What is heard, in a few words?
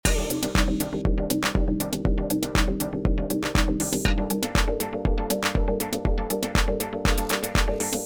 Si delay